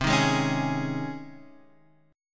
C sharp Major 7th Suspended 2nd C

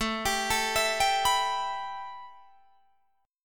A 7th Suspended 2nd A, Guitar DADGAD; A7sus2; arpeggiated

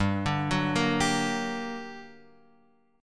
G Minor Major 7th, down, Guitar, Open F; GmM7 arpeggiated; JGuitar

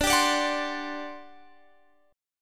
G Major 7th Suspended 4th, D

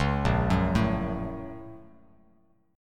Bb Minor Major 7th Sharp 5th, Bass Standard; BbmM7#5; arpeggiated; JGuitar

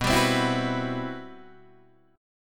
C-Major Sharp 11th B, guitar

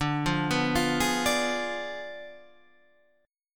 D Diminished 7th D down Guitar Standard; Ddim7 arpeggiated; JGuitar

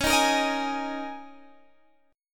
Eb 7th Suspended 4th Db

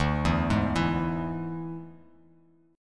D Suspended 2nd Flat 5th D Bass Standard; arpeggiated

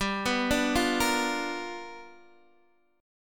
G 7th Sharp 9th G chord, Guitar Open D

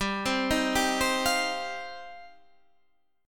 G 7th Suspended 4th Sharp 5th G down

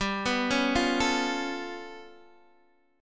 G 9th Flat 5th, Guitar Open D

